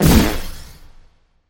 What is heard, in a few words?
magician copy ult copied expire; kitty0706 sinclair sounds